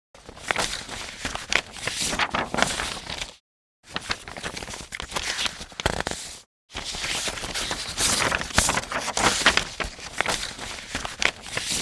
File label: Paper shuffling and organizing office supplies